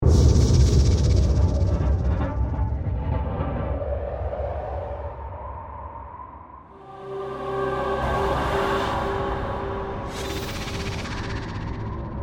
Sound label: Composition Ghostly, Horror, Composition, Ghostly, Haunting; Scary Soundscapes; BlastWave